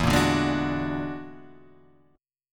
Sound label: F sharp Augmented 9th E, chord